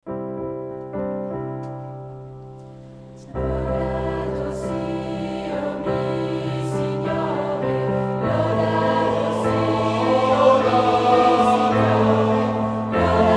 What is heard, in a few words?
Schola